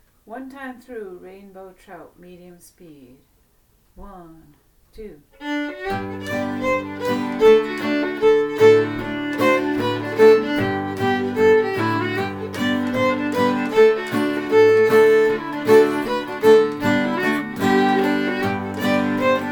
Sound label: fiddle guitar medium tempo; Rainbow Trout